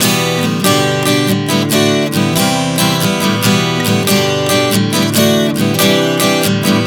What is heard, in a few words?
Prog 140 Em G D C, Acoustic Guitar, Chord Progressions 140 bpm